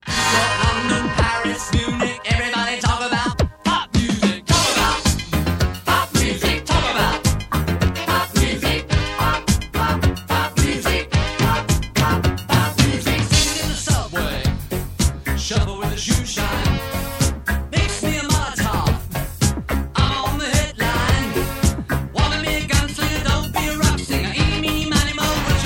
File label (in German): pop musik